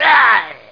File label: pain, player; quake sw